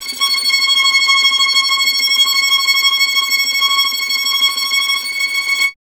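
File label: string violin tremolo; Miroslav Vitous; Solo Instruments VOL-2; Solo lnst. II; Vln Trem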